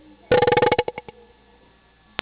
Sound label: Hornet